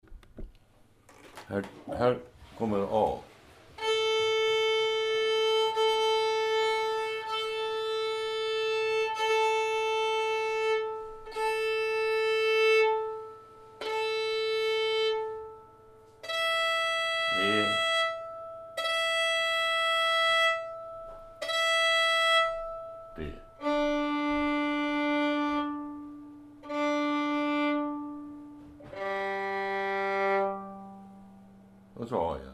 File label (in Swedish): Stämmtoner Låtar för inlärning